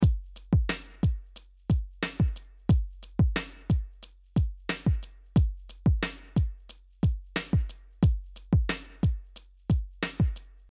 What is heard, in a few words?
HIP-HOP